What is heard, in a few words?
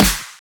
soft hit finish; osu